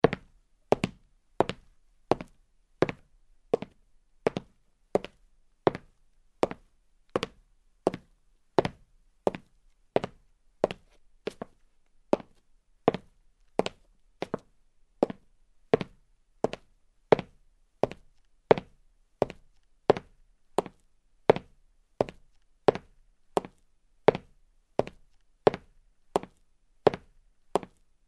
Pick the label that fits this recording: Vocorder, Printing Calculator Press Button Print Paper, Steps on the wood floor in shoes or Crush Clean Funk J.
Steps on the wood floor in shoes